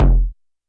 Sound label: BD Ana Loose; YAMAHA RM1X